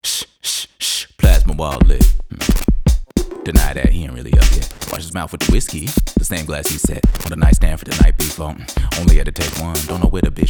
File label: Organ; Mood Organ